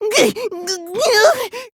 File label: ghh ghh; hifumi